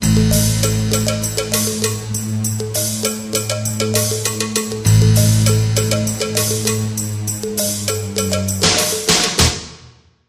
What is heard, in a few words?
ringtone scary techno